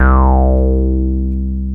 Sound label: TB BASS; TB-303